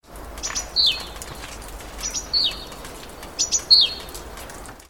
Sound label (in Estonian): Rasvatihane häälitsus; summer